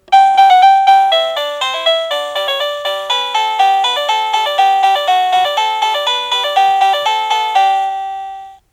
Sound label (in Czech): melodie